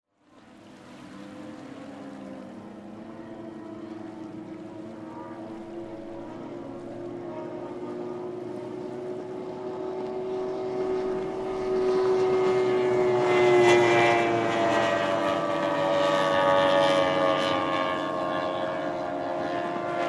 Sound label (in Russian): sorevnovaniya na motornih lodkah